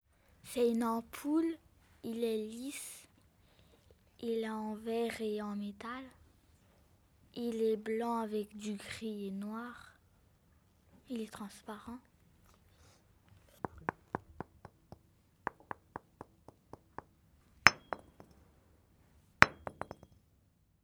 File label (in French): Ampoule elec